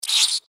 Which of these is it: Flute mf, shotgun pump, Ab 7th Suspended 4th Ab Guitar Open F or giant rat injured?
giant rat injured